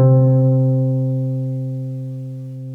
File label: HARP C2